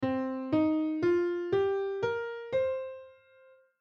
pentatonic minor scale

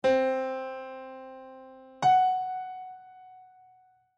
music intervals aug eleventh dim twelfth

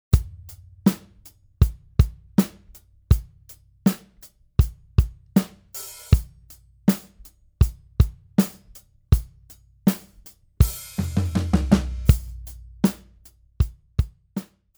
drum comp

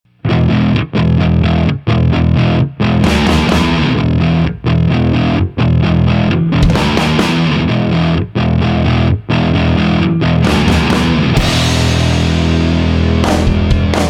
Bass through Mesa Boogie

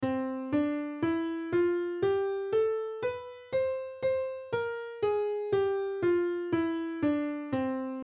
melodic major full

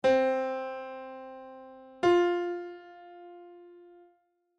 music intervals perfect fourth

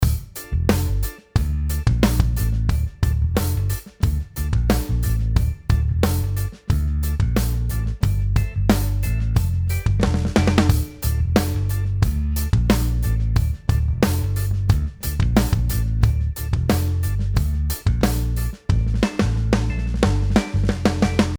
Snare fills

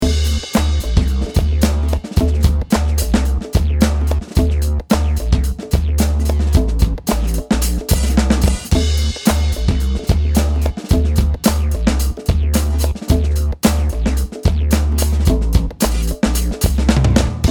Acoustic drums hats push

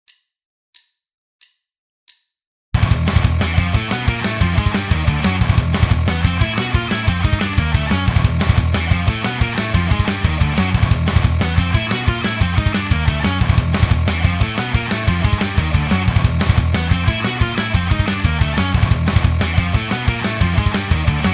riff

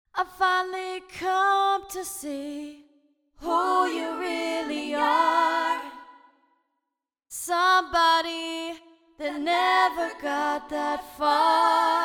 vocal harmonics

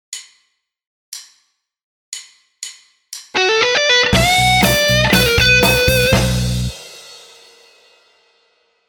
guitar style

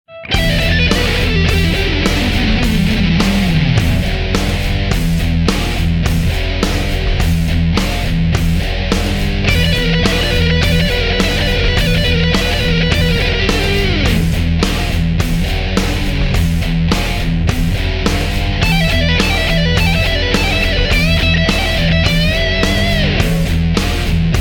Rock Pentatonics

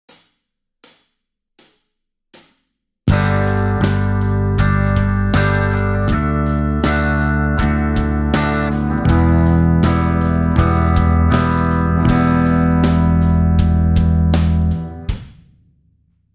major and minor chords

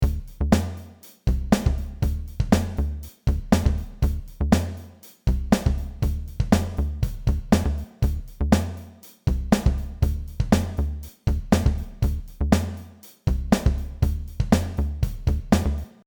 Reverb

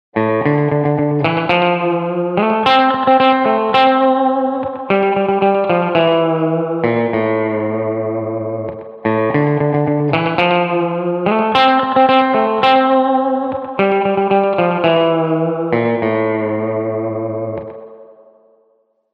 play with a vibrato

